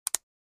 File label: Button Rubber Band Fl